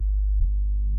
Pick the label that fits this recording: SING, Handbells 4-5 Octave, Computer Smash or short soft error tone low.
short soft error tone low